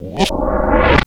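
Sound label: FX REVERSE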